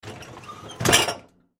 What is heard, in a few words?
Dishwasher In, Dishwasher Push In Rack; Whirlpool Appliance; Household; Blastwave